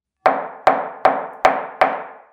zvuk molotka

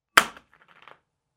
zvuk broska

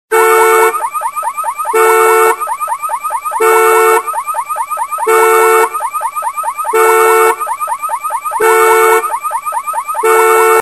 zvuk signalizacii mashiny

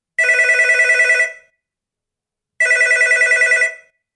zvuk zvonka

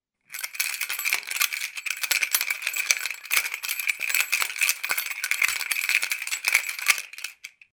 zvon kljuchej

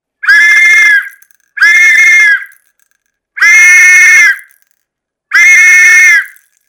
zvuk svistka

